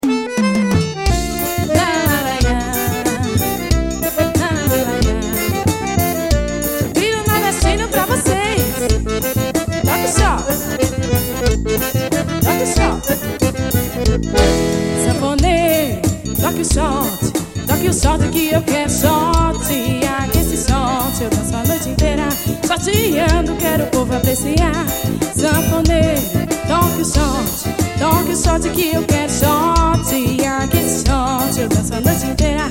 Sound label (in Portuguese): toque xote